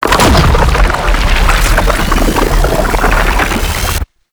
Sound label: Oblivion Charge; Octopede; Warframe; ManInTheWall